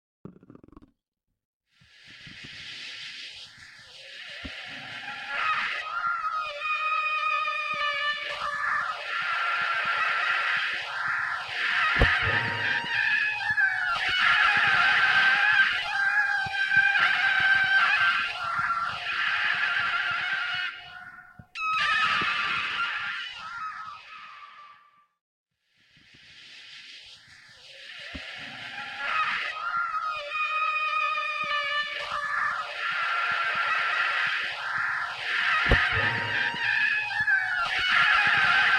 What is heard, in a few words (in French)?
larsen, exterieur; pascal deleuze; websynradio